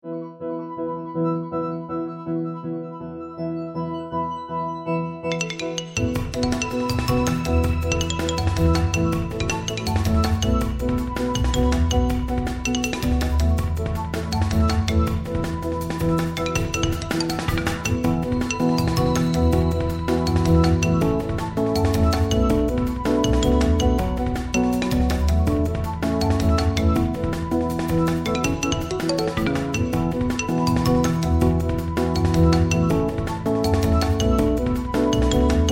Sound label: Xylo Rhodes